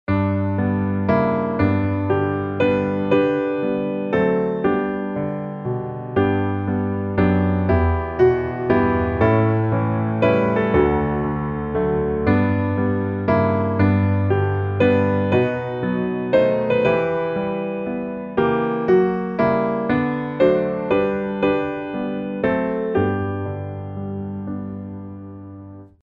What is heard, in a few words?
piano